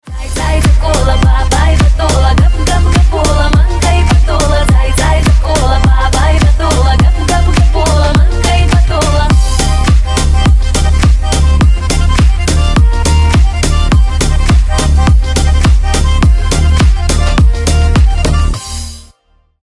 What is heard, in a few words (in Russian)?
kavkazskij bas